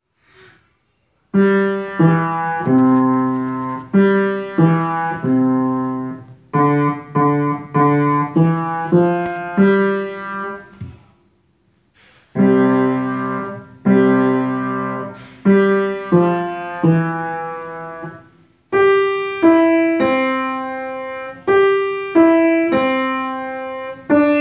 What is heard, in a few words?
Grandmother Played on Upright Piano